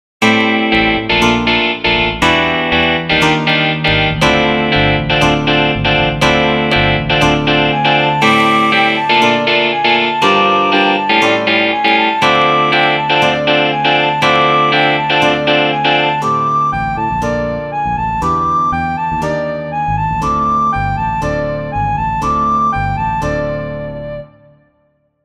sopraan; Flierefluiter 6

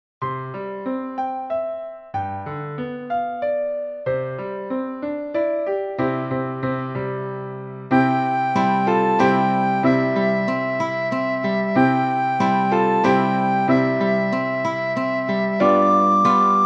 sopraan; Flierefluiter 5

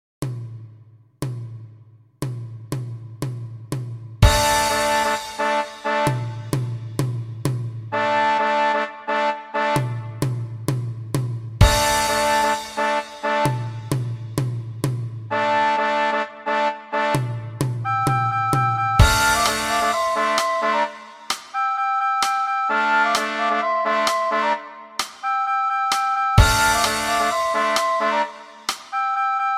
sopraan alt; Flierefluiter 7

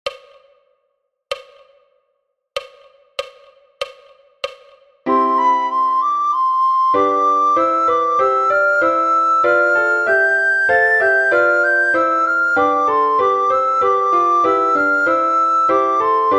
langzamer sopraan; Flierefluiter 5

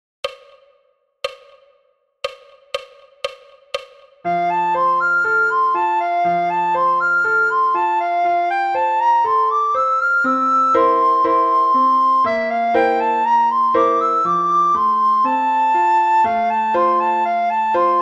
sopraan snel; The aristocats; do mi sol

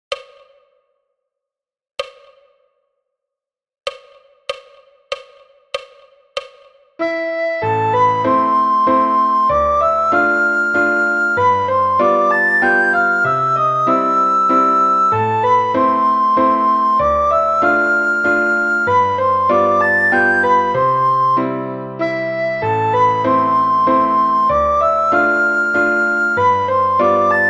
sopraan; Flierefluiter 6

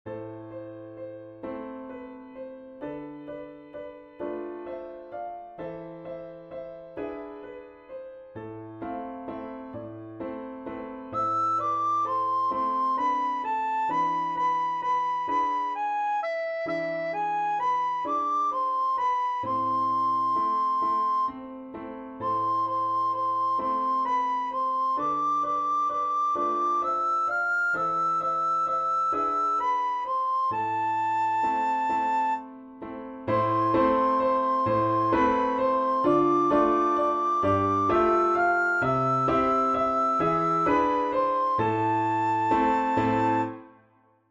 sopraan; een nacht in het maanlicht; Blokfluitles

